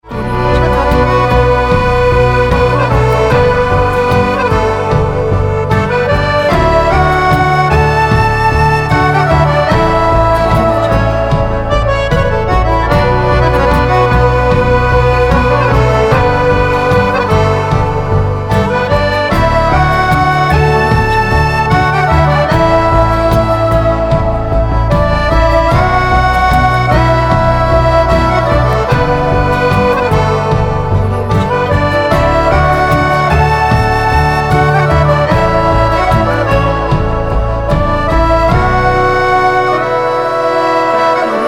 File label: folk inspiration trailer